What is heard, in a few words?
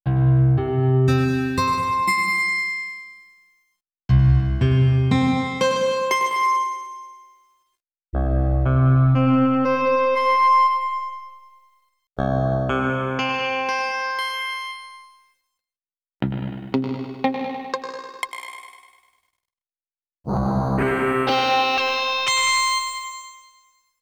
Guitar